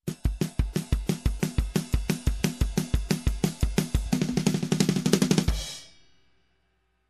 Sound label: Fast Rock Drum Groove